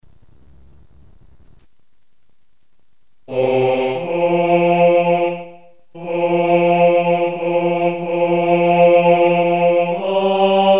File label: away tenori